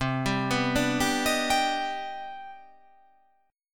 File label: C Minor Major 9th, chord